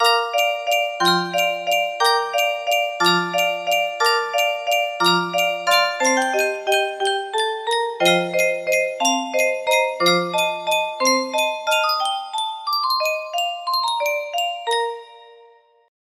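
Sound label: ringtone, music box melody; nokia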